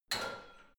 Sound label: barbell heavy plates lift rack, fitnessstudio